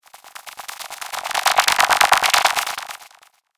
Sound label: Laser Alien Beam, sci-fi laser sound effect; Laser Future - Alien Beam